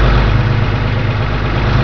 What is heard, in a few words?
TRUCK RUN; TRANSPOR